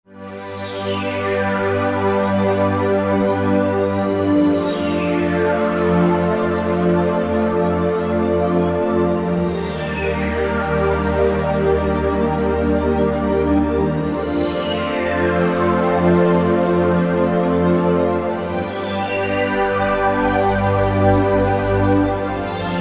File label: Sweep Stack; Roland SR-JV80-09 Session